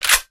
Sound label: w1300 open, weapons